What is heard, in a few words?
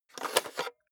cassette out of cassette player soft